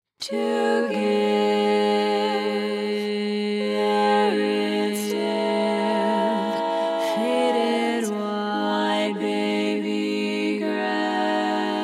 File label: All Parts, Barbershop Tags, Soft version